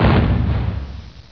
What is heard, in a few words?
CANNON